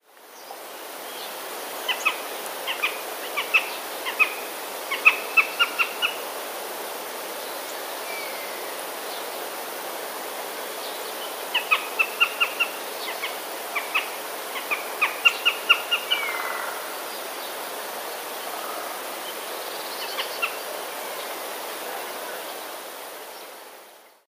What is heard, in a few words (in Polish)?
sojka; Oto sójka, która odzywa się głosem jastrzębia (01.03.2019).